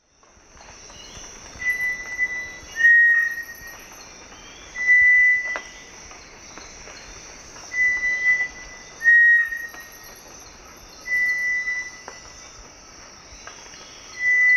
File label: Crypturellus cinereus, duet; S. America; Eastern Lowlands